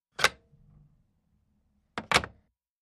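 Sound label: Telephone Pick Up Hang Up Heavier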